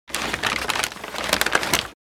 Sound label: Christmas toys wrapping paper short